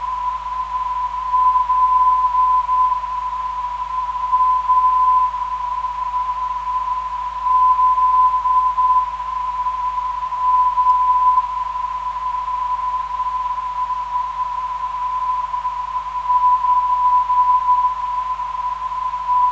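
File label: 307kHz, non-directional beacon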